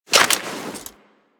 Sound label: Equip, Weapons; Warframe; Tenno; Soma Kama